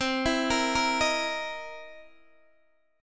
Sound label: C 7th Sharp 9th, Guitar, DADGAD